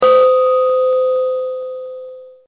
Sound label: Notifier Chi Gong; Blackberry Bold